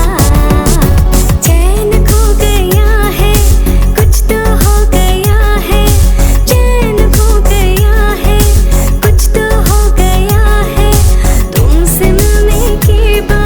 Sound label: Beat Bollywood Mashup